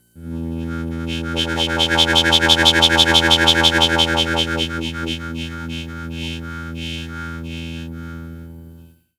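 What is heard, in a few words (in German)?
Striso board Ein Ton geschüttelt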